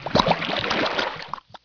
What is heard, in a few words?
submerge; objects; Heretic II Demo